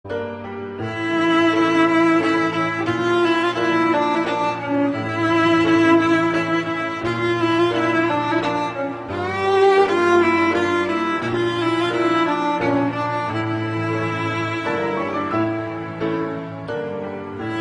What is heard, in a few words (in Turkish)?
keman zil sesi; cep telefonuna keman sesleri